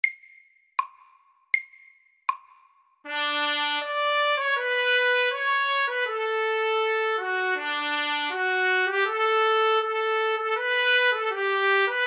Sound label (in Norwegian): Etyde i E dur SAKTE